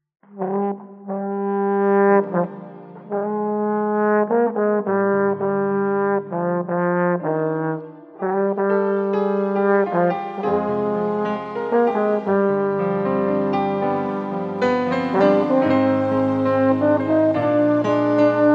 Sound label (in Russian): инструментальная композиция с баритоном; 2017 Апшеронск, Подростковый лагерь